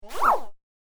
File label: Platform Game Jump Sound; Video Game Sound Effects; electronic sound effect